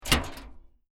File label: Metal Impact Trash Can Lid Drop; Metal trash can close lid. Muted, restrained metal hit clank; Metal Sound Effects